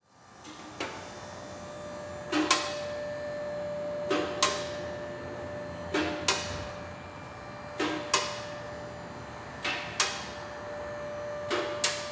Industry Ambience Auto Repair Shop Door Open Then Closes